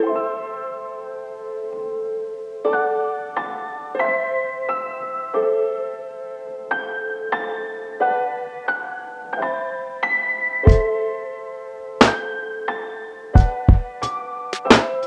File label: Lofi Hip-Hop Beats, Chill Lofi Beat